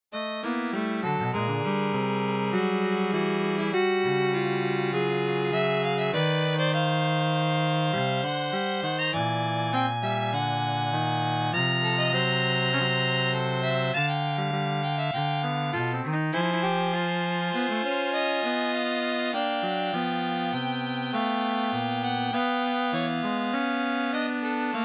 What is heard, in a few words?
Quartet